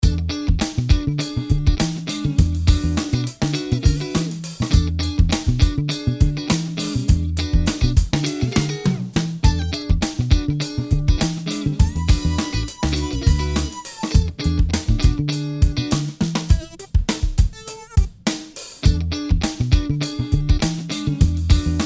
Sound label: funky, guitar; modern guitar